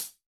disco hihat

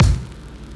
drumbreak kick; Hiphop

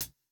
japanese pop hihat

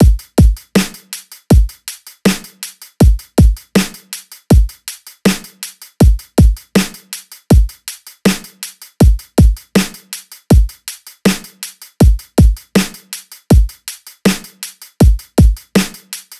Classic hiphop loop 80

